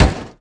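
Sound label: stone hollow, Impact; Cars; XBOX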